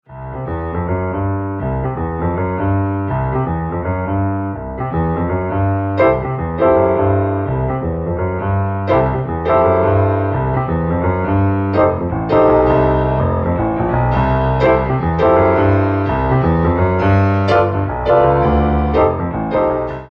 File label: piano, boogie